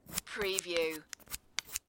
Billiard, Pool, Snooker - Apply chalk to tip of cue